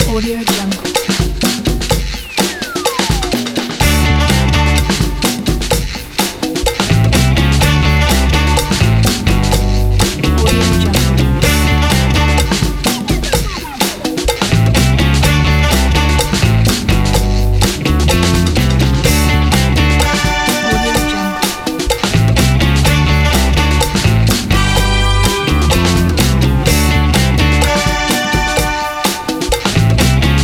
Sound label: vintage latin funky groove